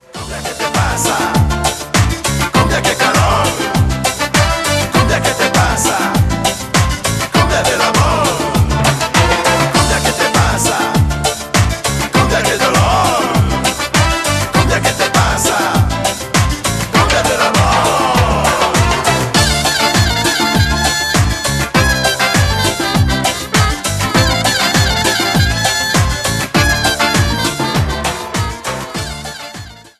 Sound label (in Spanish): cumbia que te pasa